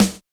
Yamaha DJX 2B, Snare H Hard

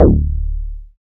BAS TX-8020T

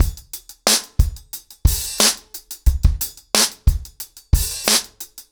ACOUSTIC DRUMS, 90 BPM

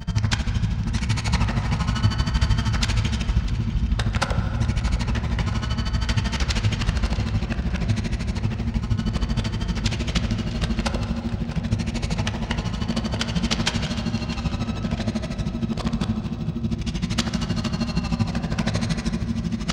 shutterbugs; Sonic Foundry (Sony Creative Software); Ugly Remnants VOL-2; UR 2 FX (Long)

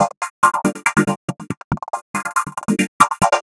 synth 140 tricky chops; Transmission-X

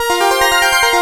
Korg, FX

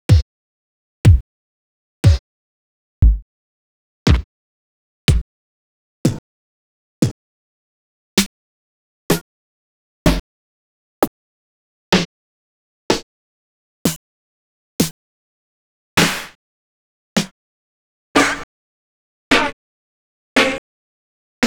Vinyl BD SD; drums&percs (chains); X-Static Goldmine

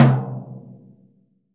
MTOM MF; VELOCITY TOM 2

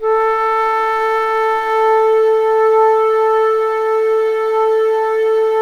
ALTO A3, ALTO FLUTE; USB Soundscan vol.23

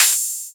Open Hihats, Cymbals; Vengeance - Essential Clubsounds Vol.5

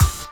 KIT, Dance Mega Drums, HIP; Best Service

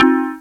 chord

